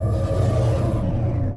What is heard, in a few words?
Base Construction Complete